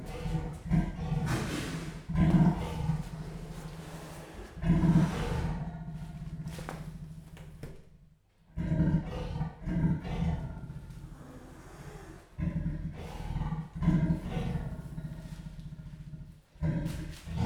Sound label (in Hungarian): kissé felhangosodik, leopárd; jaszberenyi zoo 2015